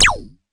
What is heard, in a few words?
Phase-Q, Percussion Hits